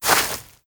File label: Grass Walk; moul-assets